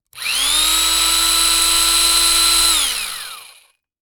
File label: Industry Tool Drill Drilling Continuous